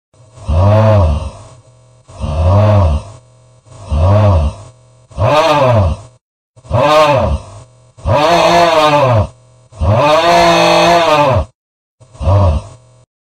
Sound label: augh